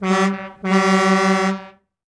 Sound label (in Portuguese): buzina